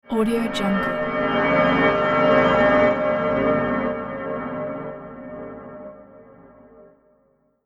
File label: fabled magic transition; sonic-boom